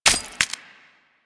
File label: Modular Secondary Handle Reload, Guns; SU Modular Guns; Reloads